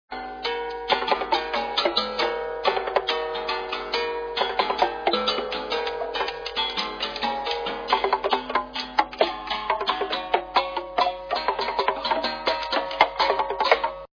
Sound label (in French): arb corde